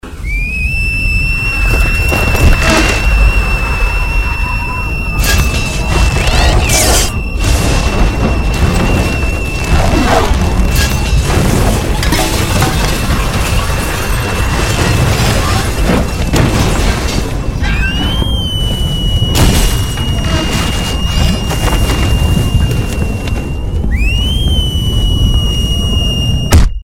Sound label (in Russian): svist; solovia razboinika